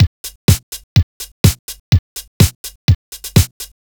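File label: Electropops No percussion, Drum Loops 125bpm; Nu Dance Electro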